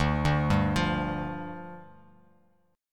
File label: D-Minor Double Flat 5th, D, Bass Standard; Dmbb5; arpeggiated; JGuitar